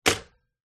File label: old rotary telephone receiver 1986 down hard